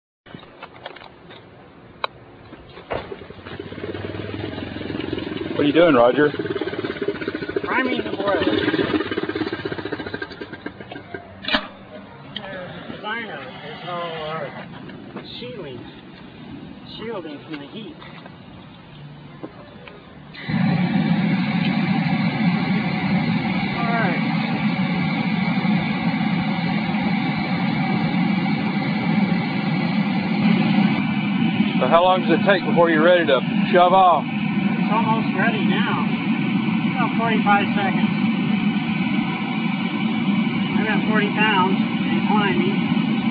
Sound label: steambike